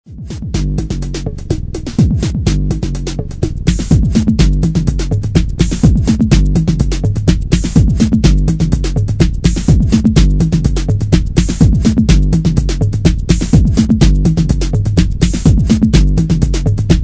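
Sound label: 808 bass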